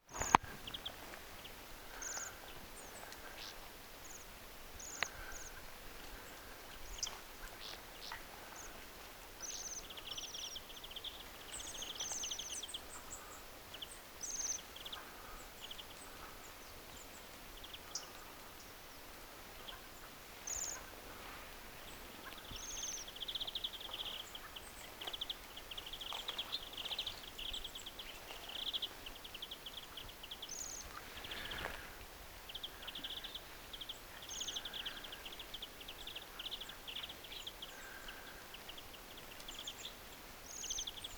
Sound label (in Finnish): ensimmaisella ruokinnalla saaressa tilhia viherpeippoja rakattirastaan aantelya tiaisia; linturuokinnan ääniä; räkättirastas